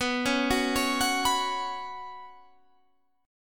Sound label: B Suspended 2nd, Guitar, Standard; Bsus2 arpeggiated; 19,16,16,18,19,19; JGuitar